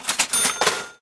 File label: cash; HEDZReloaded; effects